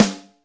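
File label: Snare Overtone, Alesis D4 drum machine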